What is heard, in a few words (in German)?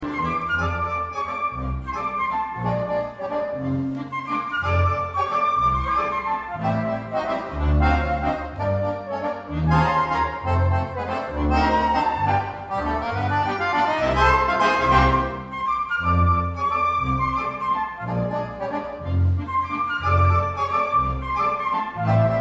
Walz in Swing